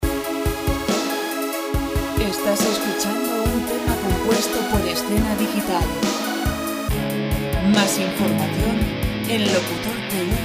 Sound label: 140 guitar rock; Música de rock sin copyright